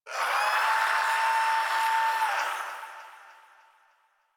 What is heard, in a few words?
Shadow Vocal Idle; Warframe; Duviri